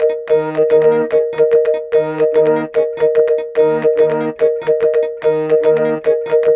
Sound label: Vibes Synth Combo; Sonic Foundry (Sony Creative Software); Cinematic Funk Urban Tone Poems; Session 05 - The Triad